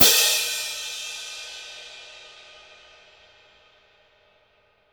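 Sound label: cymbal, Orchestral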